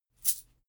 chain mail armor movement

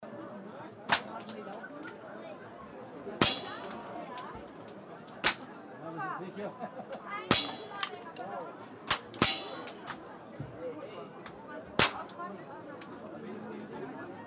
shooting gallery

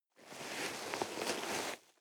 nylon leather soft

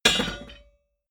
hammer hit metal

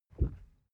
cardboard wobble short soft

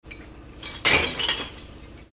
breaking glass waste collection

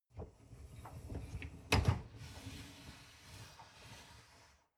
dishwasher upper tray draw out long

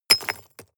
drop stones impact

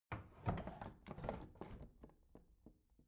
bathroom drawer open rattle

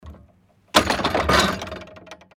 garden door close

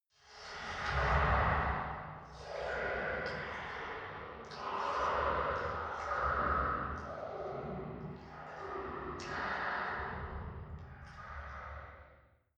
mechanic ambience dark rain

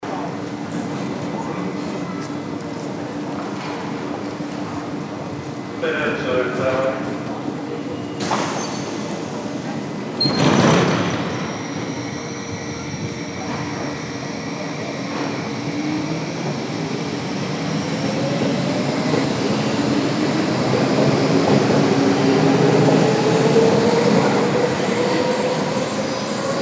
underground train leaving

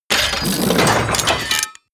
robot transformation metal

